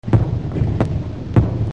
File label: Fireworks